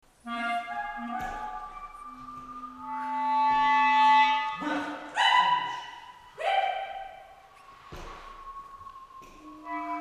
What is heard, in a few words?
birds whisper